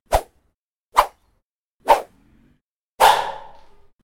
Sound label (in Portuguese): espada cortando efeito sonoro